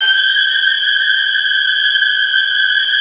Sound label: G4, Strings, STR HLF TRLL; ILIO; Kirk Hunter Virtuoso Strings VOL-3; 90 s Sample CDs